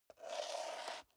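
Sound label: step high heels concrete slide long, footsteps; schritte; menschen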